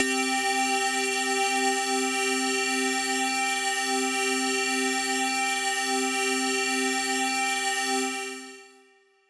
Synth; Space Jamz

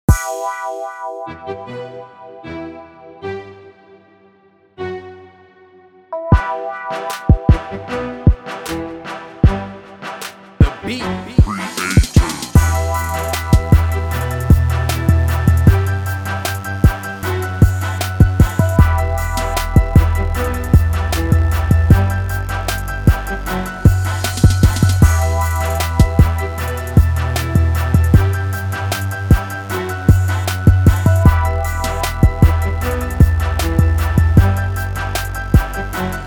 bpm154

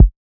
Kick; bedroom baddies

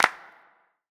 Clap

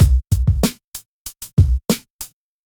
Underground Rhythm bpm191, drum breaks; concrete knock drum breaks vol

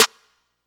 Snare; bedroom baddies

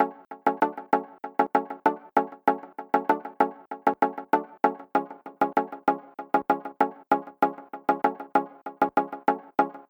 bpm97

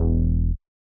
Bass; MPC expansion

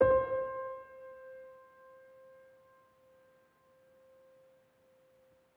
Piano; MPC expansion

